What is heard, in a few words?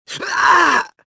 choke; luke